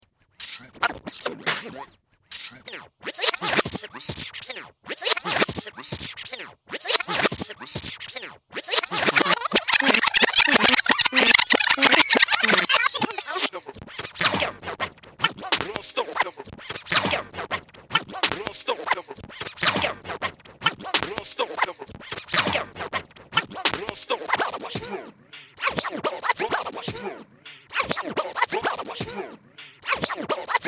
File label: scratch; VINYL 10"